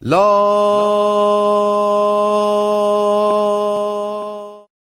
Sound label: lawwwwww, Voice